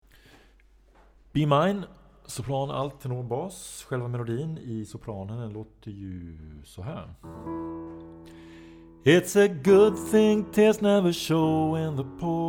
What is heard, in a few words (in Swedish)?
sopran